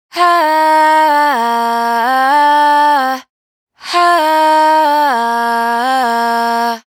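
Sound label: riff Airy Hah 128 G minor, Vocals, Dry Vocals; Raw Vocals; Slate Digital Omnivox Vocal Sample Pack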